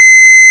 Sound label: coin; VIDEO GAMES; panic